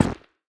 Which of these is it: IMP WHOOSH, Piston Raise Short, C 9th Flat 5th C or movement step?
movement step